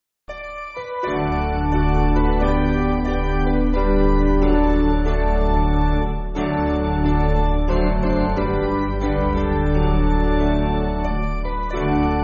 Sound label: Spiritual, Piano